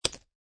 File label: Poker Chip Dropping On Playing Cards; Poker, gambling; Lynne Music